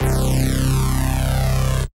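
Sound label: Rave Synth, Dance Mega Jungle Rave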